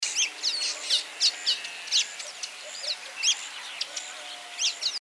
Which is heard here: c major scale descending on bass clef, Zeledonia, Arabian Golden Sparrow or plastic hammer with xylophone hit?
Arabian Golden Sparrow